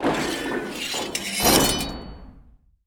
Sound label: complete broch